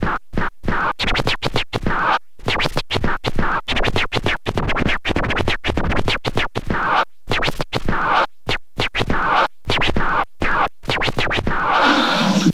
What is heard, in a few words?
scratch long, Vinyl Attack, scratches; Masterbits; scratches mix